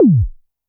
korg trident

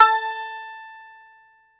BAGLAMA, Plucked Strings

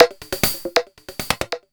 percussion loops 138; PS-45

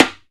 Timbales; E-MU Producer Series Vol. 2 – More Studio Essentials; Composer; Timbales Xswitch

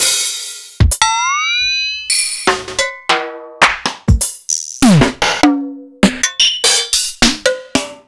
EMU Proteus-2000

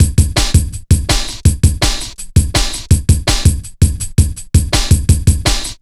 165, Drum Loops, Drum & Bass

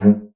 G#2, bassoon; Xsample VOL-6; C BASSOON

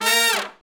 BRS R&R Horns, Falls; Roland LCDP06 Brass Sections

